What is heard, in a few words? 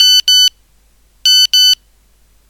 n3310 sms